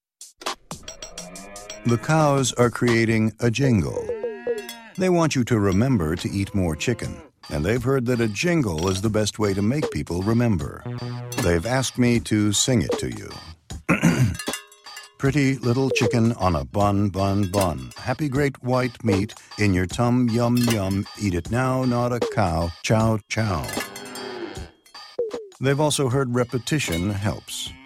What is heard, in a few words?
Jingle; Chick-Fil-A